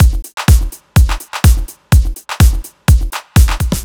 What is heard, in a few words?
No Hat, Drum Loops 125bpm, Nu Dance Electro; Chicago Vibe